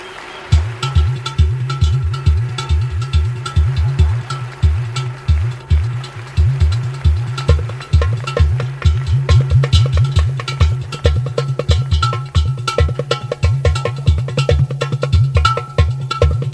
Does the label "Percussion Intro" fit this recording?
Yes